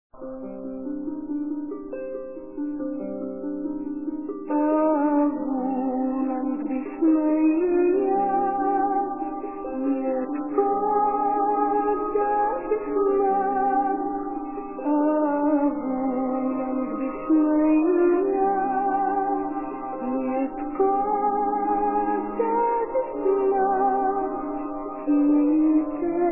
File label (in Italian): padre cantato